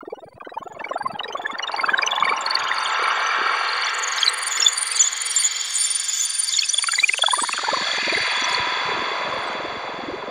alarm; Masterbits - Tekkno Trax; MACHINES+FX

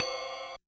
Kit Ride, Realistic Concertmate-670; One Shot Kit

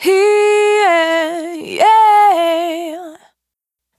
Trim, Single Voice Melodic Loops; Singles

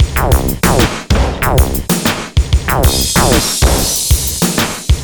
cross my arp, 95bpm

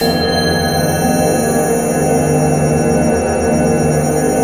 A#3 BELL; Northstar; The Wizard VOL-1; BELLTASY

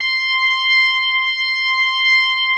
C6, B3

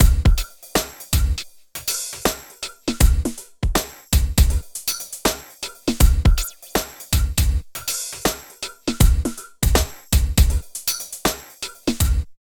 97 LOOP -R; Phat & Phunky; East West

